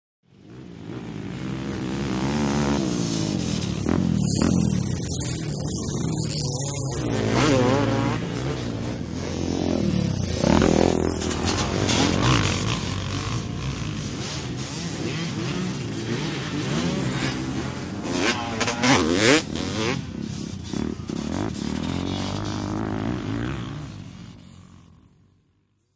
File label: Motorcycle Motocross; By 250 Cc ( Several ), Cu Fast Bys L / R, Shot Cu.